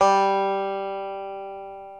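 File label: reference pitch banjo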